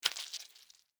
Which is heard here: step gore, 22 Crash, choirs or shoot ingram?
step gore